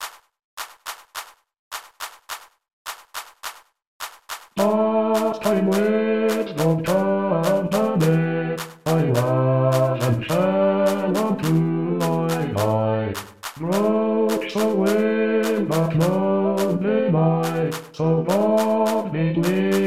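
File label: Pastime Bass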